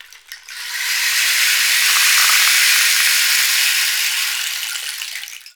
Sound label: RAIN STIC, Latin Drums&Rain